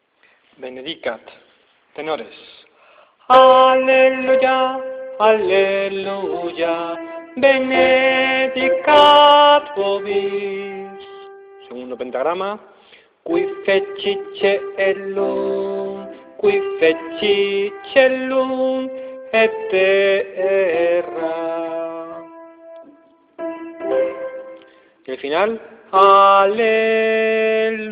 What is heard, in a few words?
tenores; benedicat